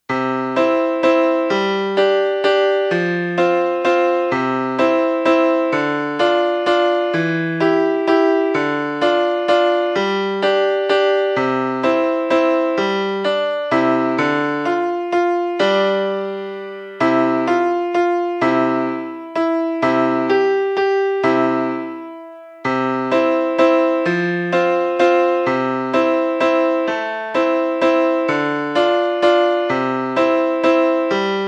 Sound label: Waltz A accomp.